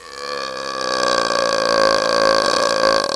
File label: Creeky Door